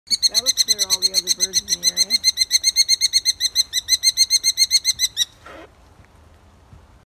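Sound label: Merlin, bird; ترمتای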